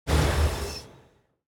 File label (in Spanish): CALADO MOTOR; SIMUZENSOUND